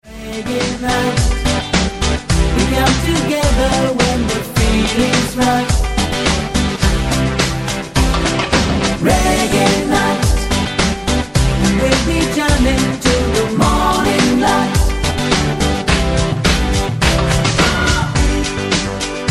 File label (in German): mit Chor